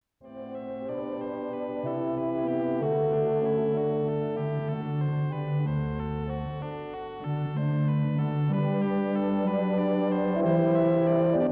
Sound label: clavioline